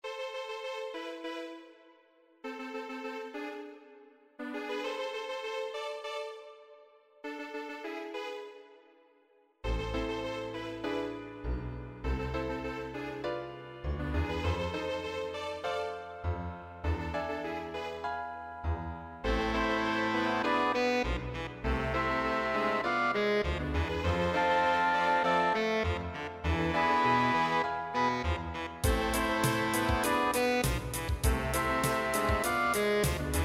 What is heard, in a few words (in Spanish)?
Tango